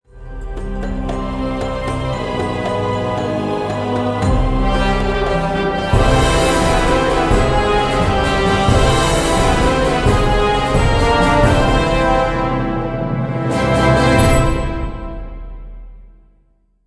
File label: Jingle Palace Success; Ankh